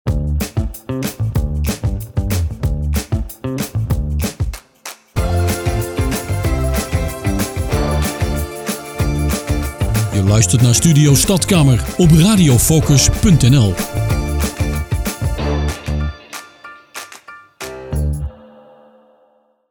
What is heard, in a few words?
Jingle; Studio Stadkamer